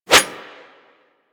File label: Claw Swipe; Manic; Attack